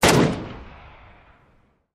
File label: Gun Sound; Gangstar; Gully Loops